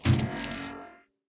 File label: upgrade drop in grate; Ben 10 - Omnicode